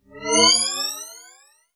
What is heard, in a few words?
Alien takeoff